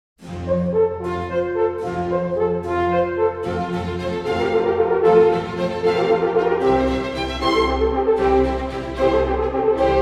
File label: Concerto in F Major for Two Horns and Orchestra - Allegro; Baroque.horn